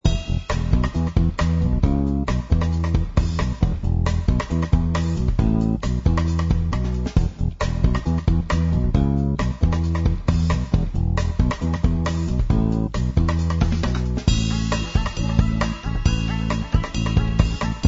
Music; project green; conservation catapult

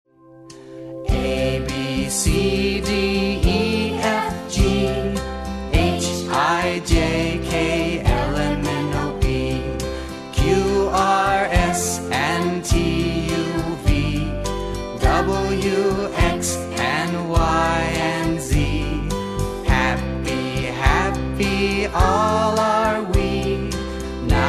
karaoke vocal alphabet song; disney; childrens favorite songs

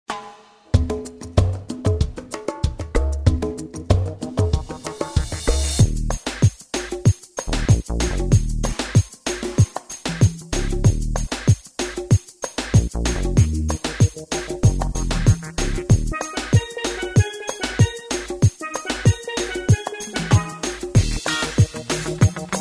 transition; phineas and ferb; dadinator

